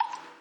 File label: switch button; Lenovo K900